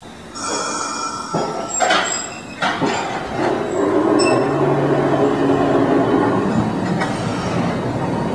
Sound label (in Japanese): densya starting sound